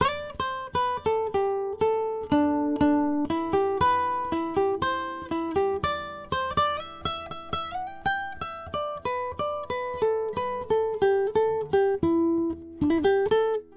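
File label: Nylon Guitar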